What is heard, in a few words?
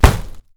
bone Hit; casualtiesunknown